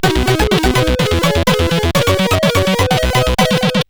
Remix, sid CSG8580R5; hvsc

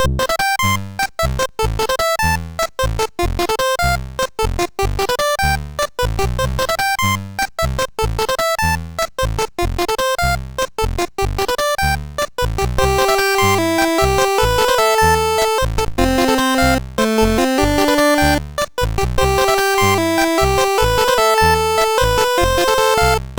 Lead Vocal, MOS6581R4; hvsc; sid

